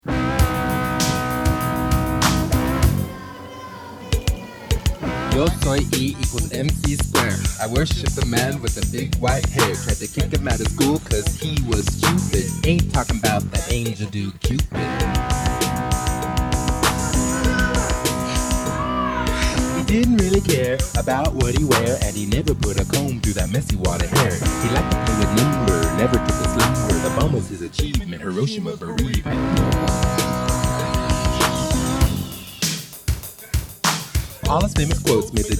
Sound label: RAP, Vocal; Vocal Demo